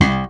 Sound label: pop bass; E-MU Formula 4000 Series Vol. 3; Analog Odyssey; VK+ Instruments